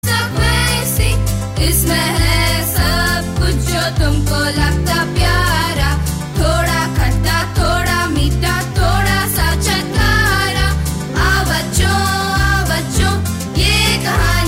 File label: Come With Us Kids, Hindi Kids